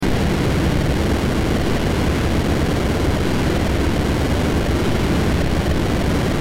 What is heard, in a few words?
death